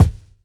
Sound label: kickdrum sample f sharp key